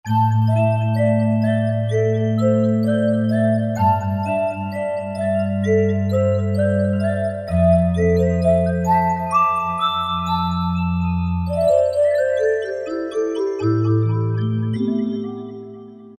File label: Twinkle, Korg MSC-05S Piano